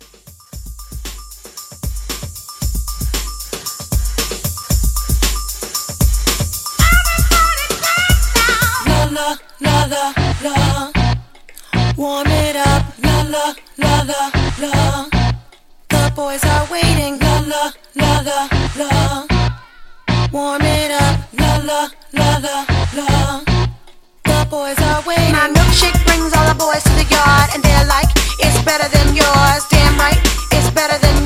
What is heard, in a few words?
Hybrid Mashup 115 CLEAN